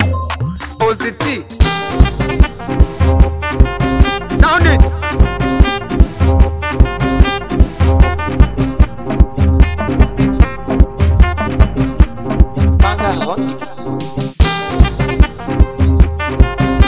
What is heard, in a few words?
Northern Mara Rhythm